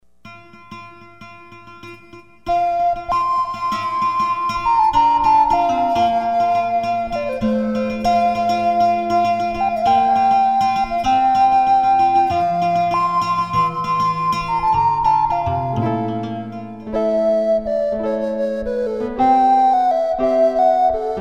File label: recorder